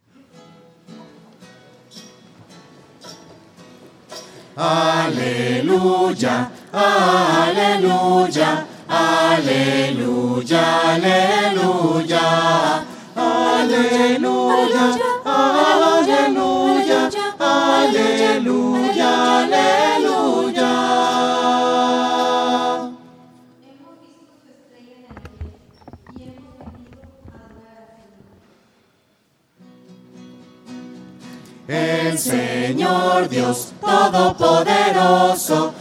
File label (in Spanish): aleluya voces; misa